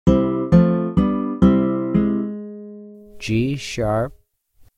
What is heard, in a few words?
G#2 Acc Bass Major; ear training instrumental color